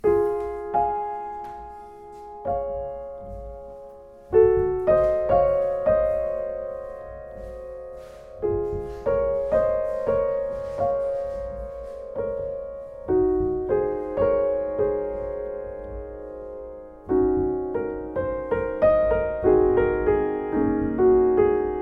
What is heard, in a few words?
play Kawai K200, piano